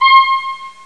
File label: synthesizer ping; electronic sounds; Sound Effects for Multimedia - Volume 1